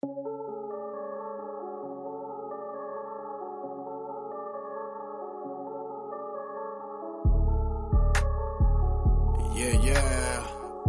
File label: Remix, hip hop/rap